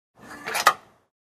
Metal Piece Clank

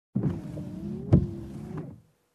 Car windshield wipers once

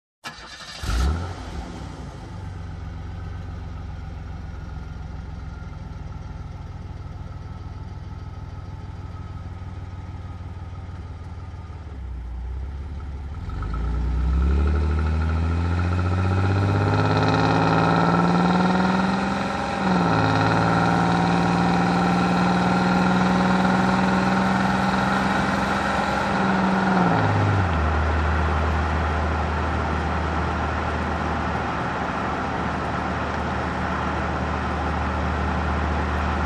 Car engine ignition and driving

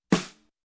Snare Hit Hard

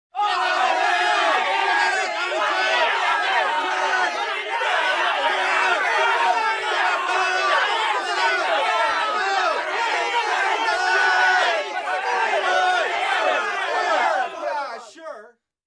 People shouting and objecting

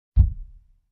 Wood Bang Sound

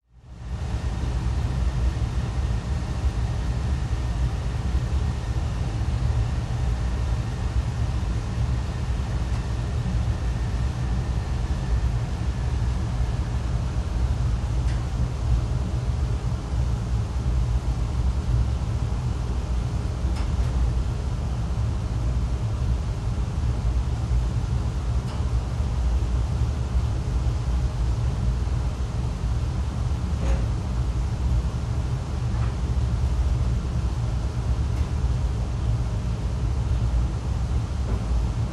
Quiet air conditioning hum ambience

